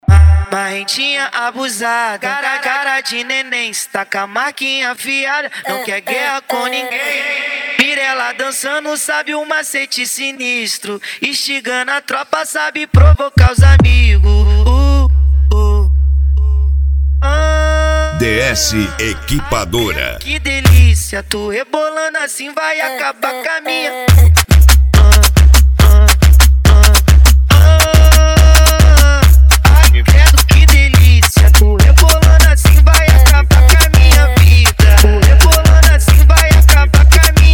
funk grave

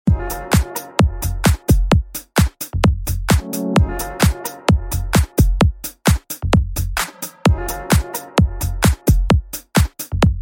eletrofunk; som automotivo

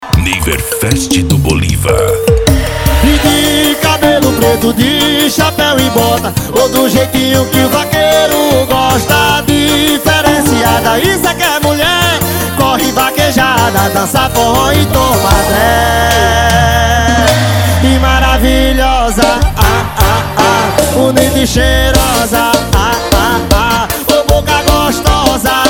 sertanejo

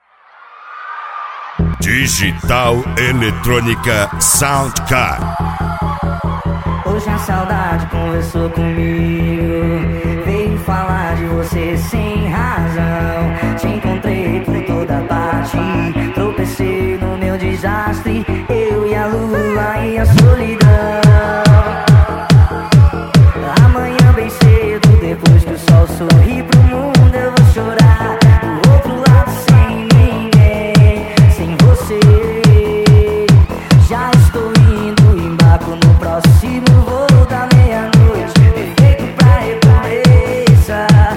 pancanejo eletronica sound car

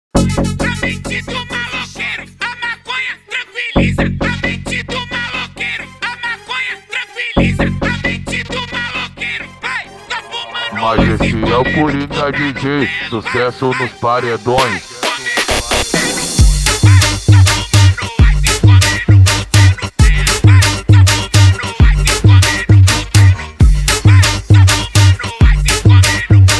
funk agressivo

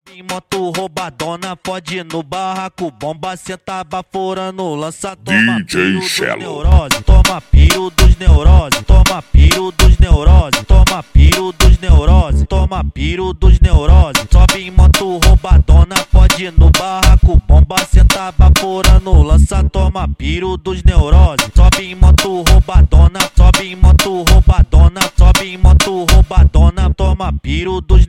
funk ritmo

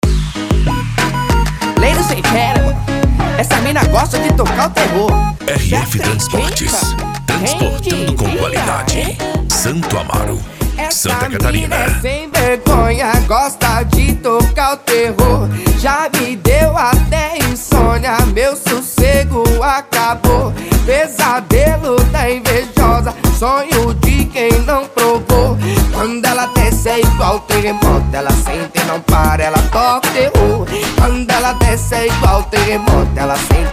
especial funk, cd

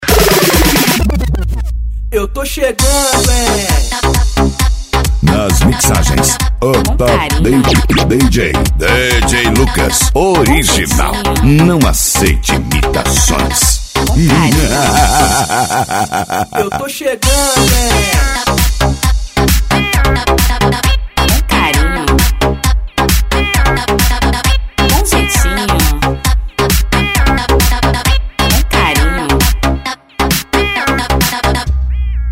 eletro funk; cd balada eletro funk vol4

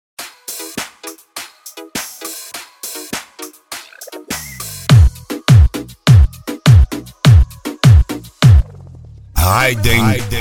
reggaeton; cd raiden alto falantes

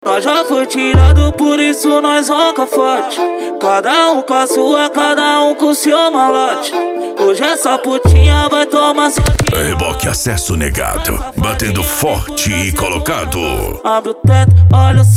racha eletronica e funk mega bass

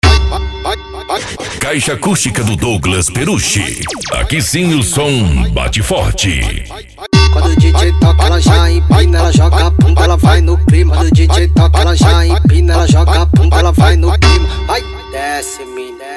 especial funk mega bass

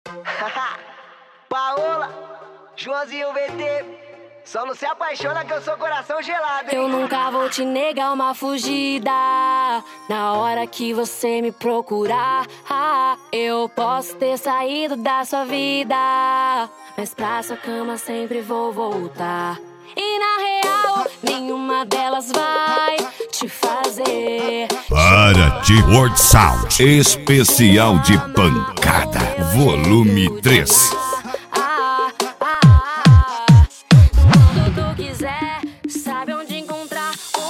esp pancada

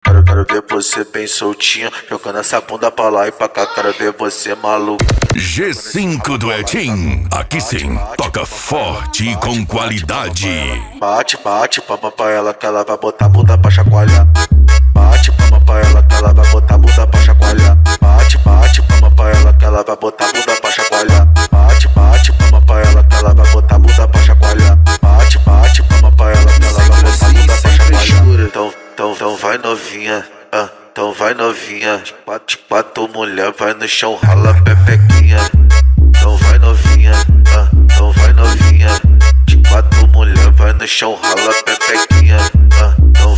mega funk e funk mega bass

